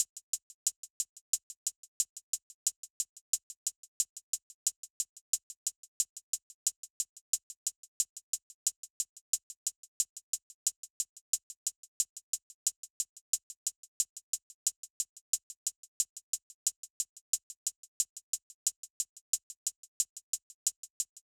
Drum Loop - 90 BPM Hihat; Loop Stems; Cymatics; Mystery Pack Vol 4; Pillow Talk

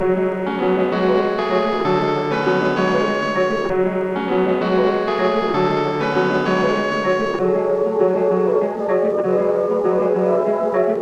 130 BPM F# Min, Melody; Pegasus

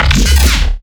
Riddim Bass Hit; Shifting

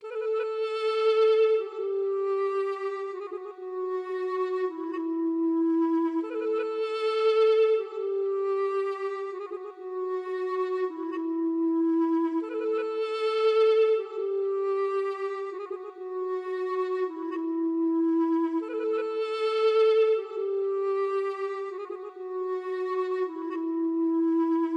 155 BPM E Min Flute, loop stems; Cymatics; 2022 Melody Collection Platinum Expansion - Pt 1; Dubai

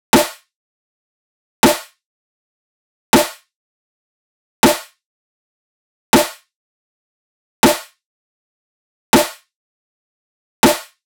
Drum Loop 160 BPM Snare, Future Bass; Drum Loops; Cymatics; GHOST (Beta Pack); Acorn

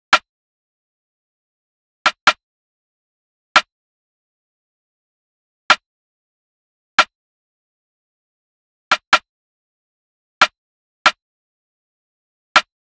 Drum Loop - 140 BPM Rim, Drill; Loop Stems; Cymatics; PARADOX II - Drum Loop Collection; Bow

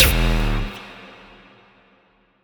impact oneshot; GhostHack

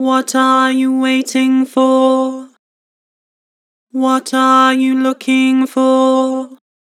Vocals, Flat, What are you waiting for; Harmor

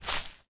Footstep Grass Light; Footsteps

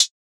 Lofi Closed Hihat; Cymatics; Lofi Starter Pack

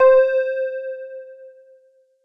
Juno-60 KEYS Bright - C; Synth; One Shots; Cymatics; Fantasy Synth Sample Pack

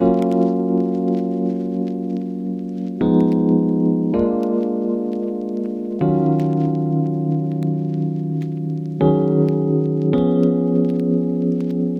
Lofi Melody Loop - 80 BPM F# Maj Keys; Melodics; Loop Stems; Cymatics; Eternity

